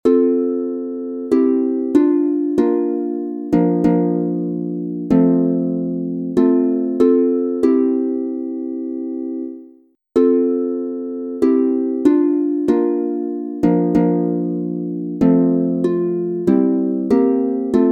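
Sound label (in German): F Dur 3 stimmig instrumental, Harfe; Lieder im Kirchenjahr; Naeher mein Gott zu dir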